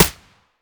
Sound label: aps silencer stereo; STALKER; IWP